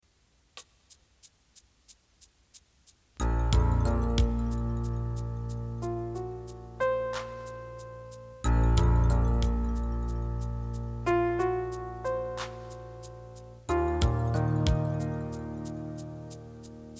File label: piano